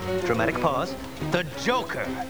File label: Dramatic; Dramatic Pause